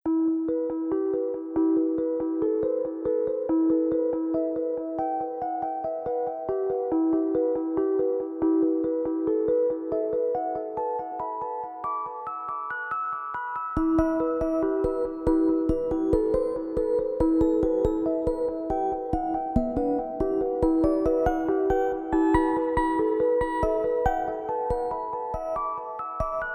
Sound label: gentle sound 432 hz